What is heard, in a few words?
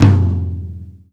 TOM, Laidback; Sonic Foundry (Sony Creative Software); Drum Tools